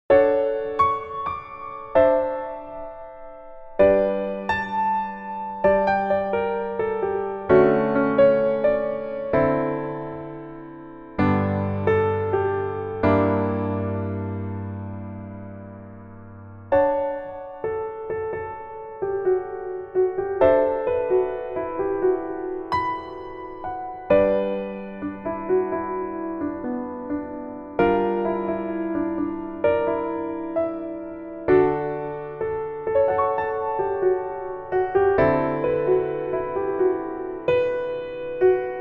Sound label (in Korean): Piano ver 멜로디MR